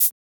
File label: Shaker; FLPRO